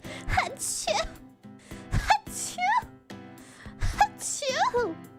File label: fake sneezes; noises